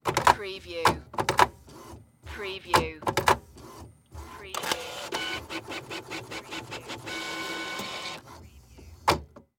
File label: Printer Print Single